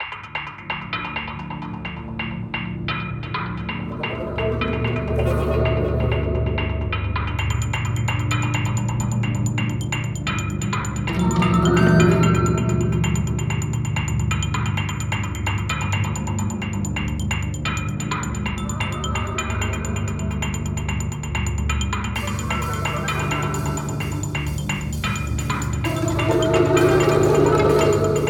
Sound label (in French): Peur; Peur 2